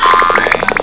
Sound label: BOINGITY, cartoon